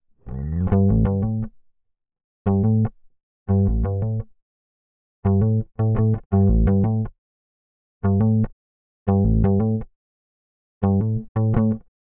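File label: BASS guitar IN C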